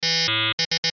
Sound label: Beeps Chime Signal, Electronic Device; Blastwave